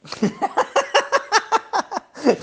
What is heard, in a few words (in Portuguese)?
risada